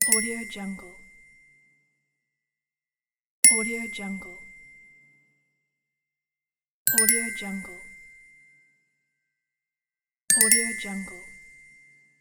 idea indication

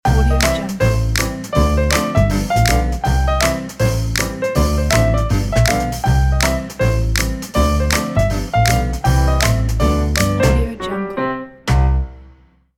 funny jazz piano logo

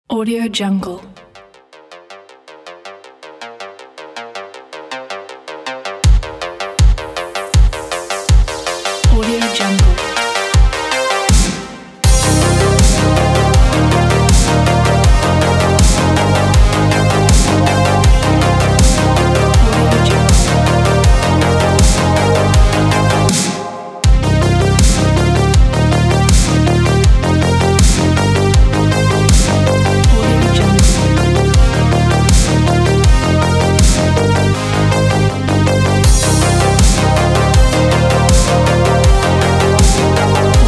synthwave

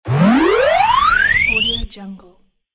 modern interface bar increase

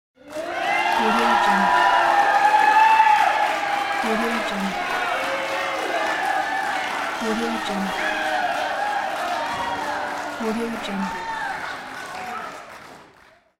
gym cheering applause

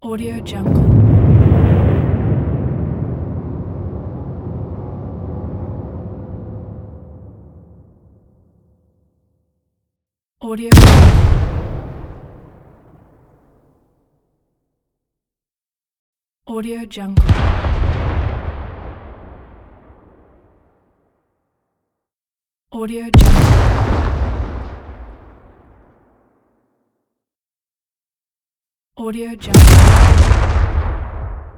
explosion dynamite

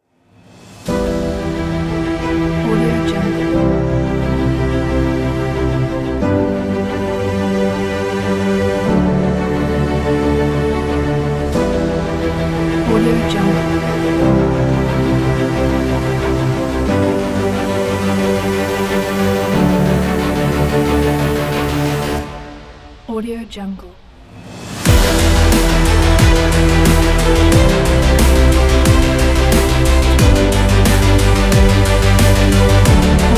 cinematic dubstep kit

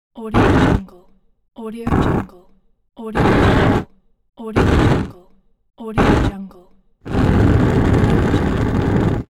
fire blasts; mantlee

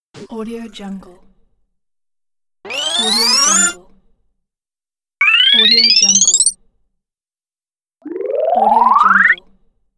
progress bar loading ui

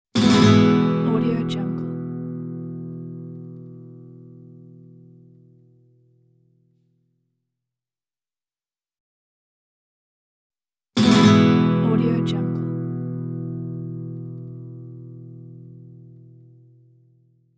spanish guitar e chord

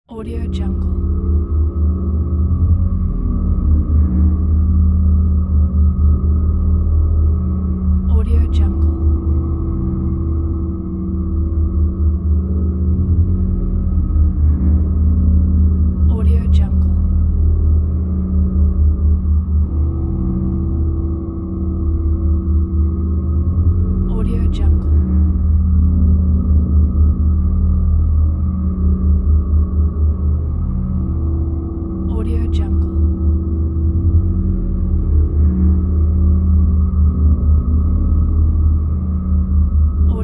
suspense atmosphere

